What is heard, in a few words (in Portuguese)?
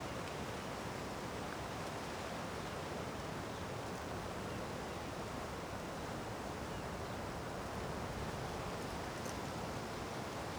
Estrada com vento com folhas e inicio de chuva; Coleção Sonora do Cerrado